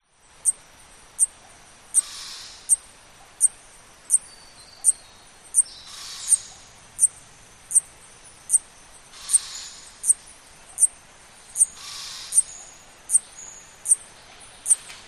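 nature rainforest subtropical australia south east queensland insects birds early evening autumn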